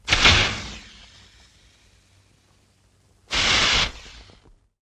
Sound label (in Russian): fejerverk zapuskaet galochki